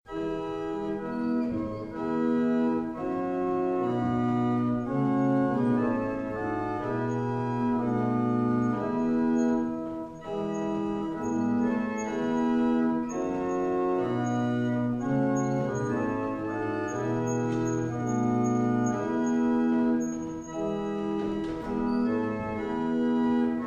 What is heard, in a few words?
Double chant, barrel organs; Wissington